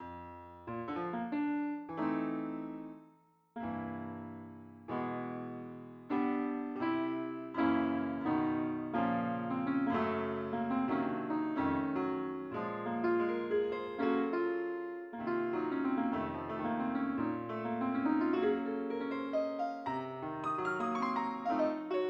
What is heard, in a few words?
piano